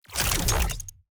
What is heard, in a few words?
Drone Laser Activation; TANK; 3Souls